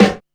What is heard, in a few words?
STUTTER, SNARES; Back In Time Records; Big Bang